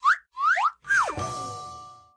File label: lose; fantastic five; Candy Crush Saga; players club